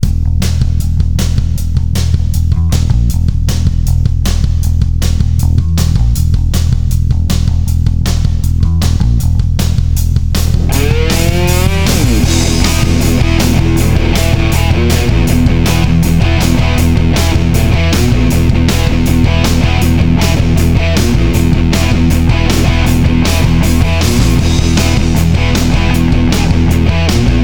Rock; Beavis and Butt-head Do Hollywood; PS1

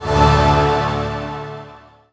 ui game loaded

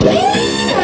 Bullride; Picnicboat; Neighbours From Hell Console Edition; GC, Xbox

